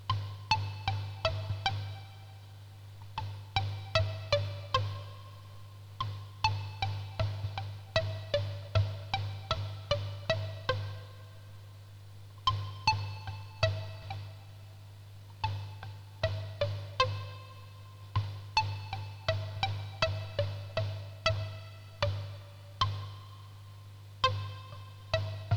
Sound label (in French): percussion digitale